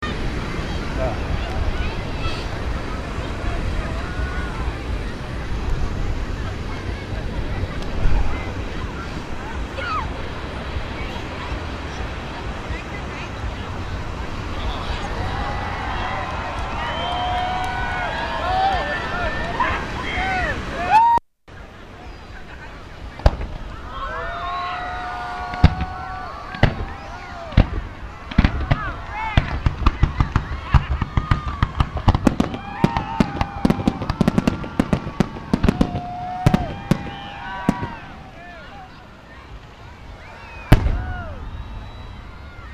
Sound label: fireworks blam blam blam, binaural recordings; skycastle